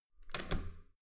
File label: metal door heavy handle down